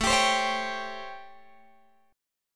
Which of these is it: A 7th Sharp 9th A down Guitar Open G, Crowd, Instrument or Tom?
A 7th Sharp 9th A down Guitar Open G